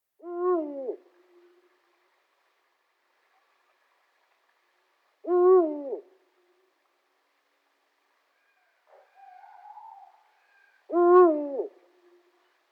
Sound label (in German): Uhu; Wald; Tierstimmen